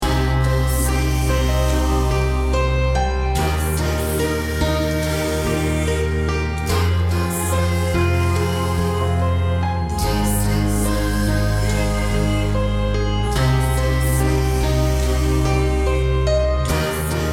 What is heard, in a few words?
Healing Melody